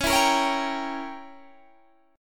C sharp Diminished 7th C sharp down Guitar Standard; C#dim7 strummed; JGuitar